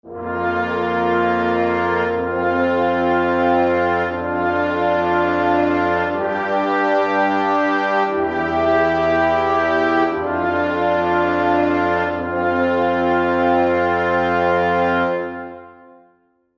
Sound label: Transition Royal Fanfare; Transition Sound Effects